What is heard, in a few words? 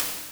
Open Hat; Genesis